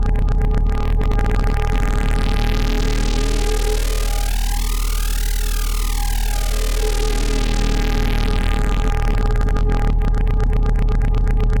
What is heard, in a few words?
Moto Synth Loop Reverse; martyn stonehouse